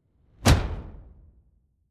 sci-fi shot bright; schusswaffen

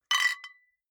flip-top bottles clink multiple; gastronomie; berufe

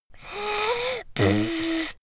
little girl snort; soundpool

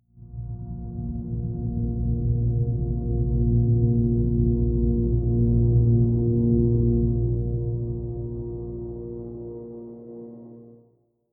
atmospheric drone pad deep; musikelemente; drones

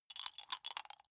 small four rattle in hand closed short; wuerfel

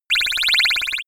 ringing small laser beam; krieg-waffen; soundpool

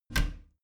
bathroom cabinet both close soft; objekte; tueren

